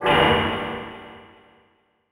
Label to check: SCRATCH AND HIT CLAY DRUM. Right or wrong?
Wrong